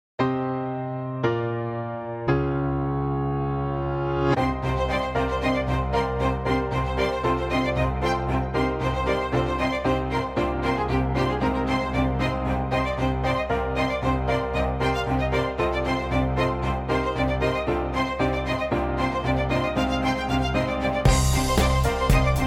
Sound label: SPICCATO HIGH SAMPLE